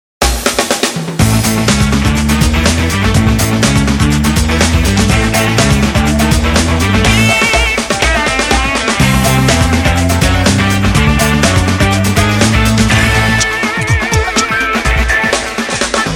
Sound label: BREAKBEATY